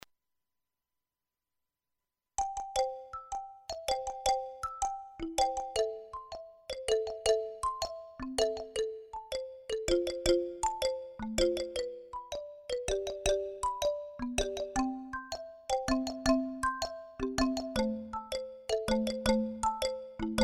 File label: Band; Monkey Island